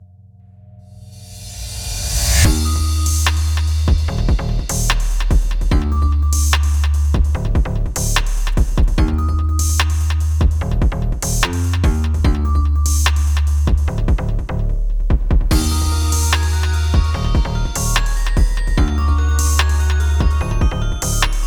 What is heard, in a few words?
Instrumental; Friday Night Funkin Hit Single; Supacarnator (Unused)